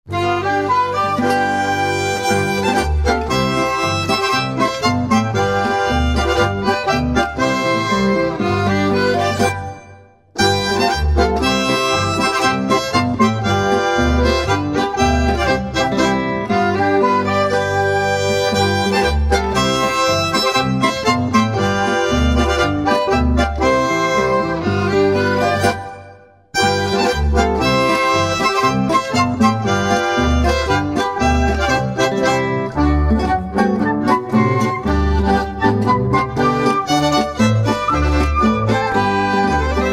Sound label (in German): Geigenmusi Polka